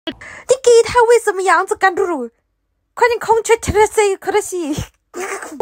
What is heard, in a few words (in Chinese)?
怪叫